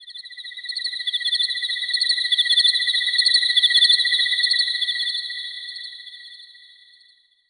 Korg 01W Crickets

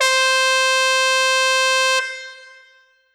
Yamaha EX5 Trumpet C5

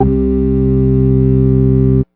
Ensoniq ESQ-1 Organ C4

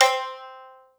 Ensoniq SQ-1 Shami C5

